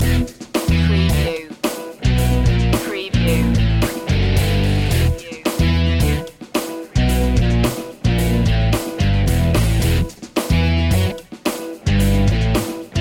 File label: guitar fusion loop